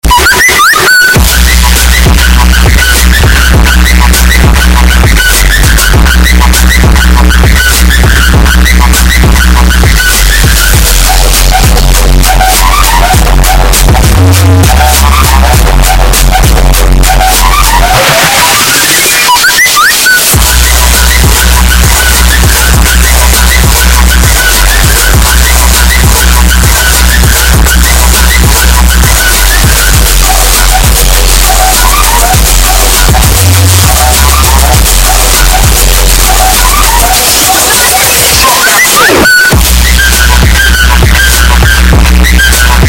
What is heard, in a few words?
samsung whistle remix earrape; trap remix; Voicemod